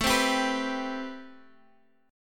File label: A-Minor 9th A Guitar Half Step Down